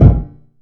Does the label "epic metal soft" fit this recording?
Yes